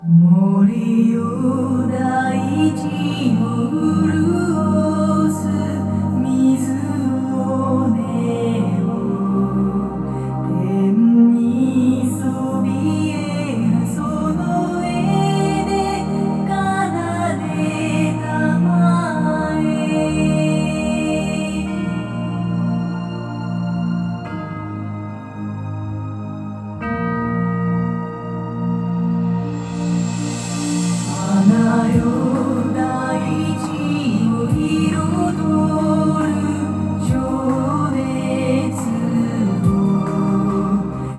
xy music

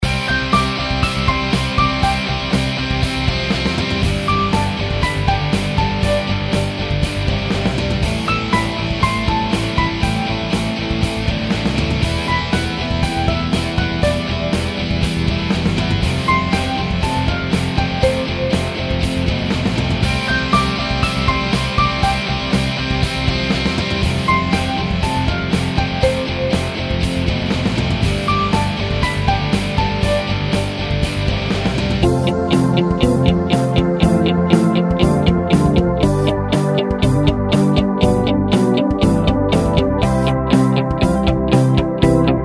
Rock

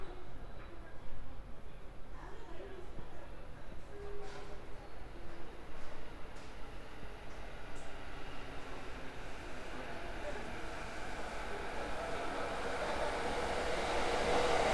train

